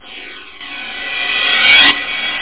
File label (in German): schwusch, whoosh; da capo vol1